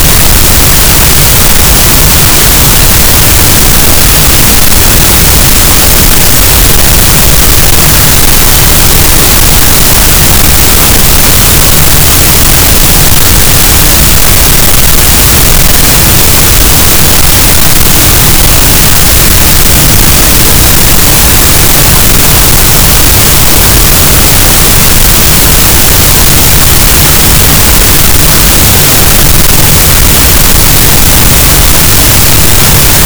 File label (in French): cachalot, Physeter Macrocephalus, gaussian noise